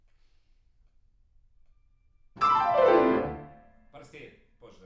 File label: PIANO